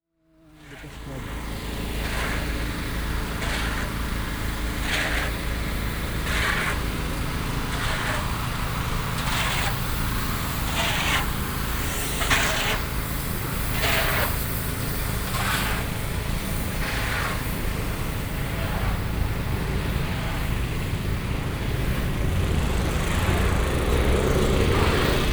nettoyage dominical; bogota

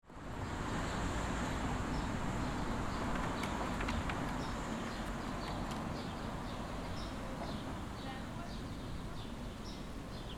place vide

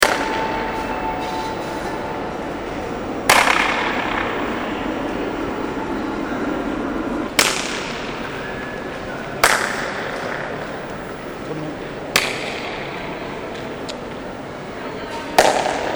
echo flottant, Lisboa Chiado

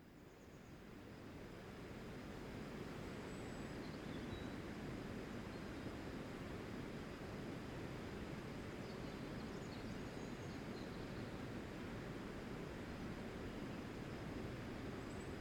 train camion; portee ferroviaire

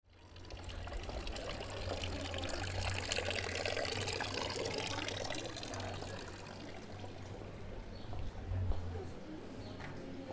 fondu enchaine annecy

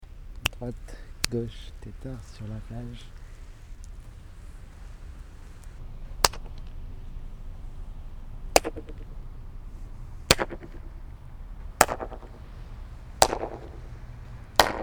echo flottant Barcelonne arche

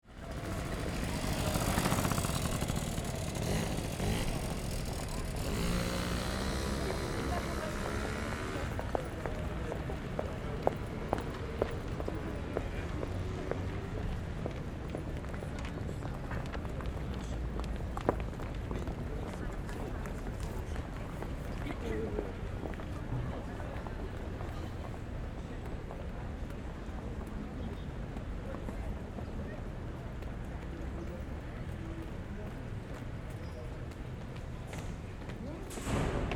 Souterrains Halles Parcours porte; porte ST eustache; Porte Lescot

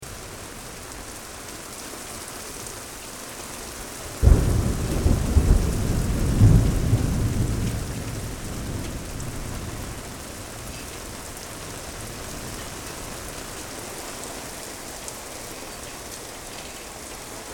decrescendo Orage

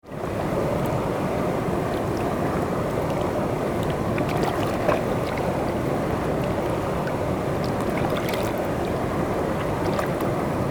vaguelette

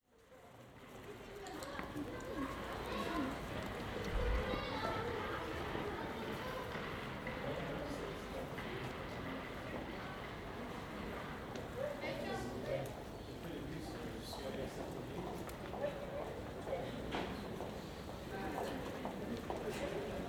petit parcours dans la Grand rue; Metabole

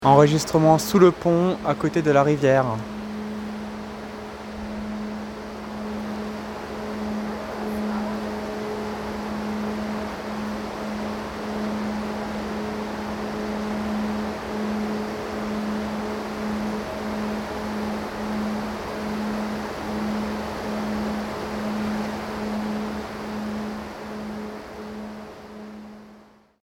Bourdon sous le pont

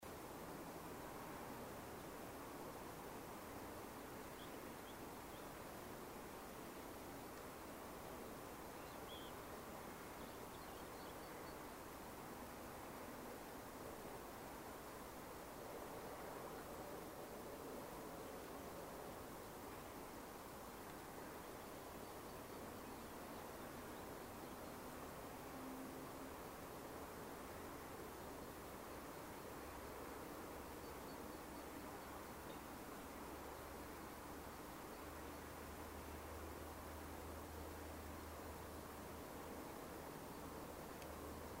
golf de seyssins

